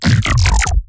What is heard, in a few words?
glitch stab; Virtual Riot; Glitch Stabs